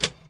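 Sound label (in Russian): звук конца вращения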